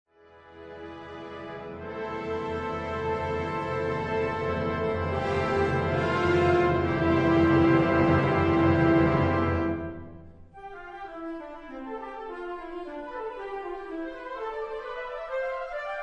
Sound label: Toccata Fugue